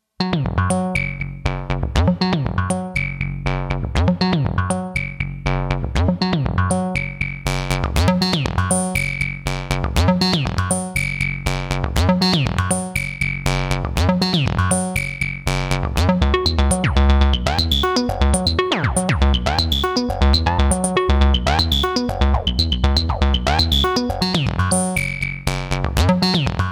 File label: mini wave